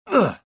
jump; Star Wars Jedi Knight II Jedi Outcast; XBOX; bespincop

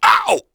get hit voice; UFC Tapout; XBOX

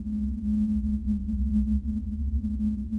ambient

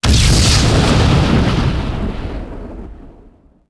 Explode

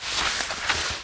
slide wood

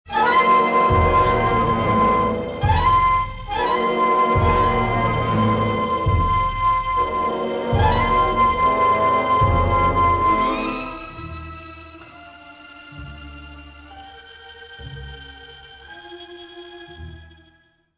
tunnels, music; Star Wars Jedi Knight I Jedi Academy; XBOX

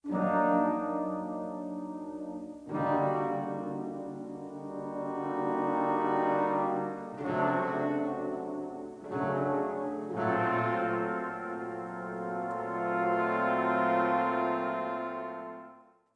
music flourish, intro

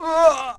bail vox; skater; Tony Hawk's Project 8; XBOX

